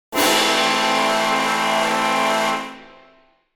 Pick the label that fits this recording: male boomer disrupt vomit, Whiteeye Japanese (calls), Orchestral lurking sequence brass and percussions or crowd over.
Orchestral lurking sequence brass and percussions